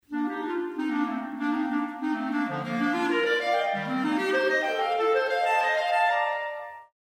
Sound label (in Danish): Klarinetter; Træblæsere